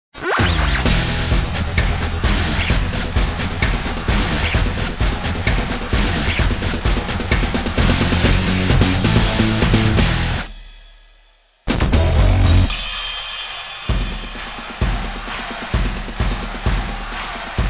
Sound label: rock